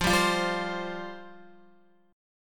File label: F Suspended 2nd F Guitar; Fsus2; strummed; x,8,7,10,7,8